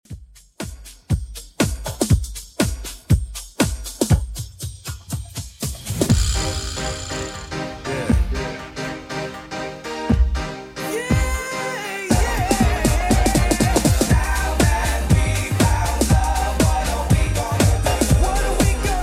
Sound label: 90s Redrum 120bpm